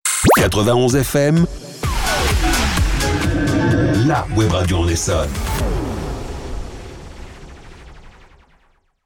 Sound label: Sweep ID; 91 FM; Essonne